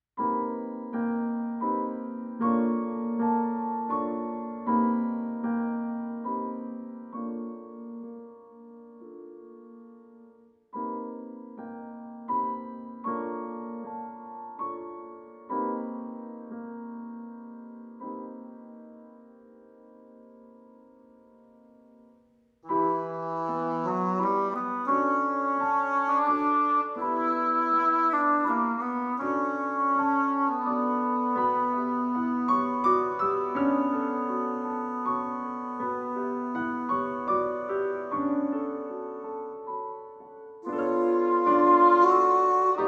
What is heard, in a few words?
Dreamy Rainy Day